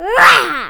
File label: child happy roar short